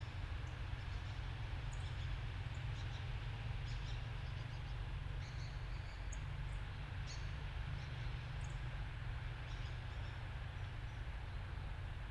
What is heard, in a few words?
yamanakako sound